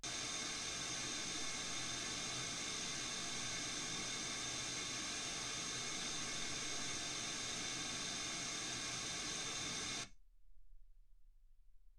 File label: wgn